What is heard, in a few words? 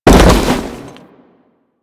Fall; Warframe; Tenno; Foley